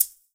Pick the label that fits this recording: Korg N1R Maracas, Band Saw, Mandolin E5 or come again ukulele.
Korg N1R Maracas